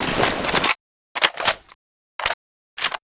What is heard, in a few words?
magnum reload; STALKER